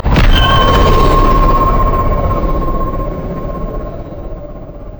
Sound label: yasuo base quickdraw wind; LoL